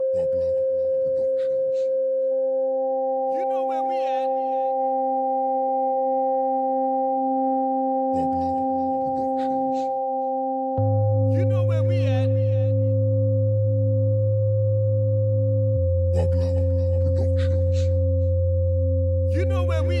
Reggae Dancehall

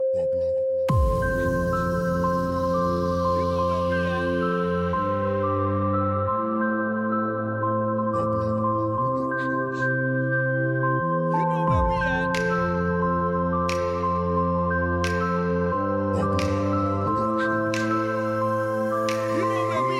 POP EDM

